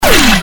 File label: evil pain; CAC ASSETS